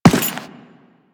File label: Run Default, Footsteps; Warframe; Teshin